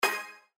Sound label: Spin Stop; slots; Robot Vs Monster